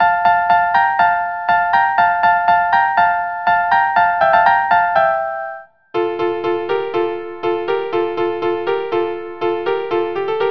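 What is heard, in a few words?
violin unaccompanied; Autumn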